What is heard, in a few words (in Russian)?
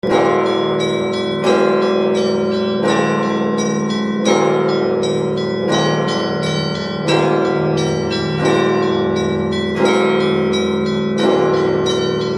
ЗВОН ИОАКИМОВСКИЙ